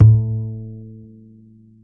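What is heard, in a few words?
acoustic bass soft A 2 R; AC.SFT A 2-R; Masterbits CLIMAX 7 - Bass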